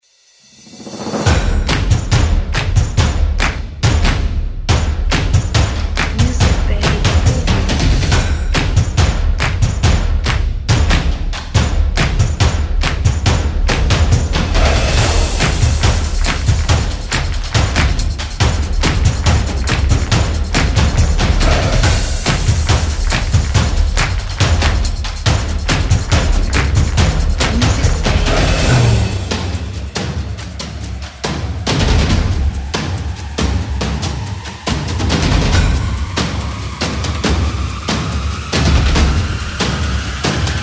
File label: Stomps Drums Fast Motion